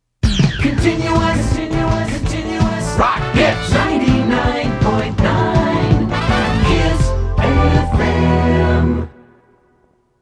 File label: Kiss Continuous; Continuous Rock Hits